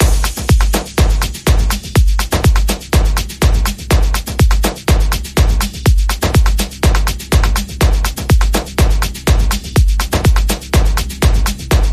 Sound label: Seductive Synth Rhythm; 60sec